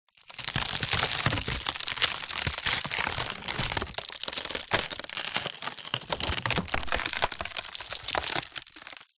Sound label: impacts wood collapse break splinter